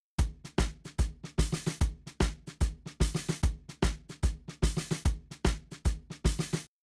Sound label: Drums Shuffle SN HH; WDR2